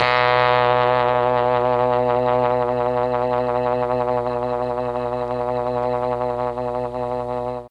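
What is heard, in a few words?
B1 trombone trumpet, mellotron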